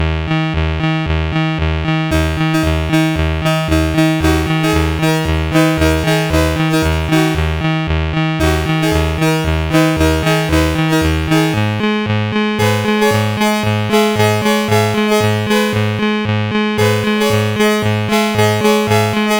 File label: Music 4, sid CSG8580R5